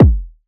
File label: kick monster, Hip-Hop; Drum One Shots; SD CAICOS Hip-Hop Sample Pack; Slate